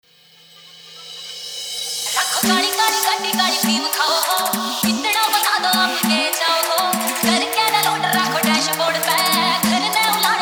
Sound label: Hard Bass Remix Song